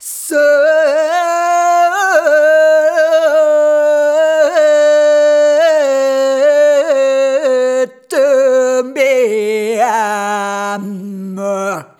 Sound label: Rhythmic G